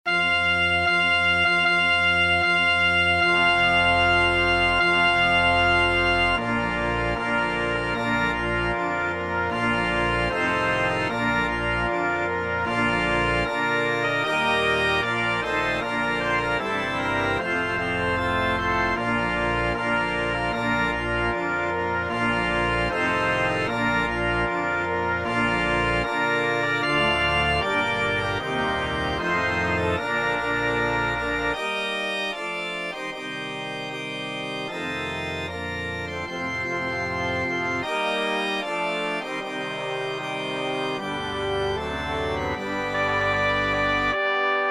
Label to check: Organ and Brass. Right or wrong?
Right